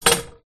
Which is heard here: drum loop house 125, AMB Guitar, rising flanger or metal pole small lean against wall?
metal pole small lean against wall